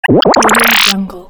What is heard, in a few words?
power up